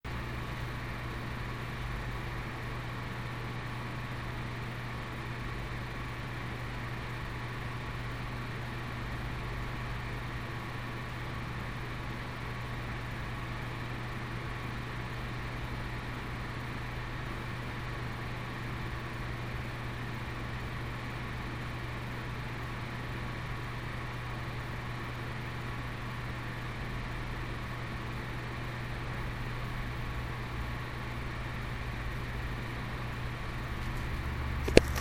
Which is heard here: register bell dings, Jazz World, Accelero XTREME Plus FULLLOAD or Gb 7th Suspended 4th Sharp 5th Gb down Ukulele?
Accelero XTREME Plus FULLLOAD